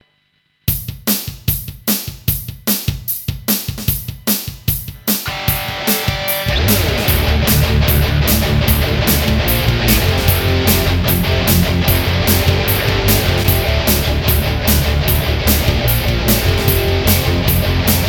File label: Instrumental track